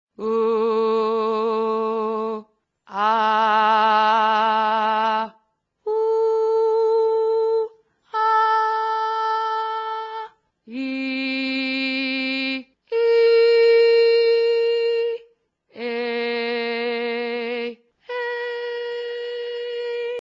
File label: vocals